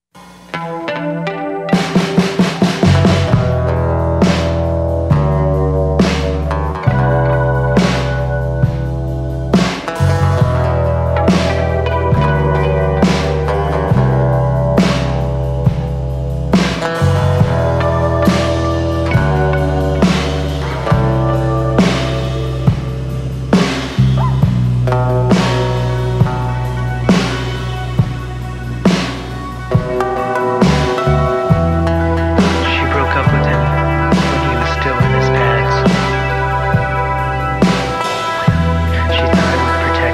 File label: hockey rink sad song